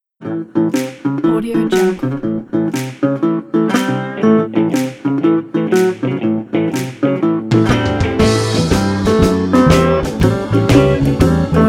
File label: blues vintage slide guitar